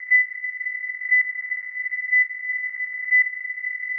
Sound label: optimized filter